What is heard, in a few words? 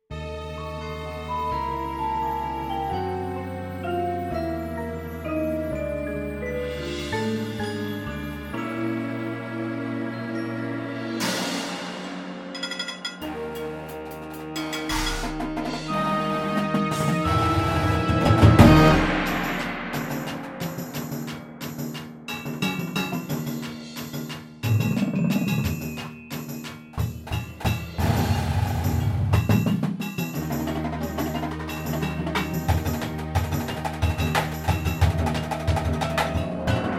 metal, marching band